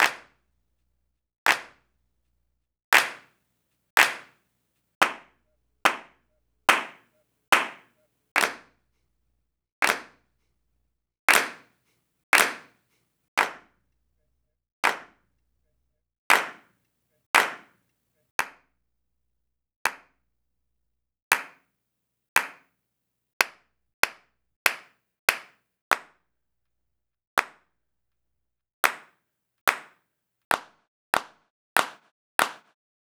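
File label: Roland MV8800 percussion MV REALCLAPS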